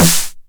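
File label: 909 SD Tape, snare drum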